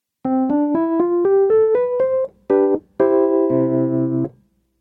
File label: Wurlitzer 140B